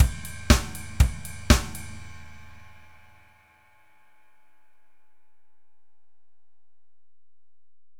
Basic 4 Ride Drums; Drumloops; Dr. Fink's Funk Factory

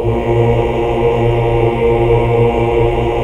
AAH; Best Service Gigapack 1

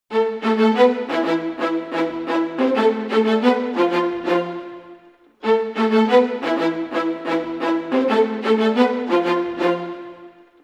90 a min strings theme, Orchestral arrangements

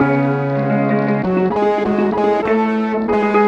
Dusty Melody, Cinematic Funk; Sonic Foundry (Sony Creative Software); Cinematic Funk Urban Tone Poems

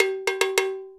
FILL, Percussion

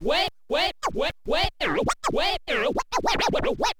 scratch short, Vinyl scratches

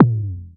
MS-20 Tom, EMU PX-7 Drum Machines

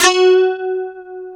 DS8 F#3, Korg

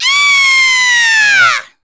SCREAM, SHOUTS; Best Service; Hyper Dance

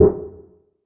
perc organic blur

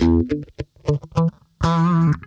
THUDDY, Funk Guitar; 90 s Sample CDs; Zero G

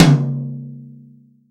TOM, COATED TOM

